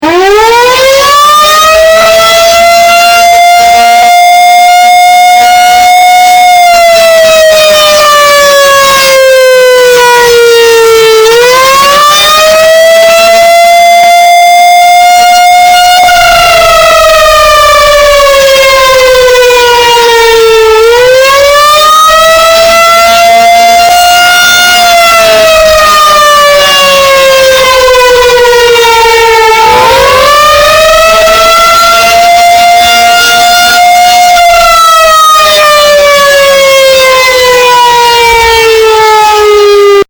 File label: Wail, Siren